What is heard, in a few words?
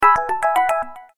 Message incoming; Samsung SGH-T939 Behold II